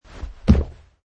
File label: Landing; Man wearing jeans and hiking shoes jumping and landing on carpet surface - variation 8; Wrestling and Struggling; Michael Huang